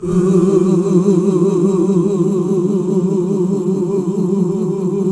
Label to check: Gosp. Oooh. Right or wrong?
Right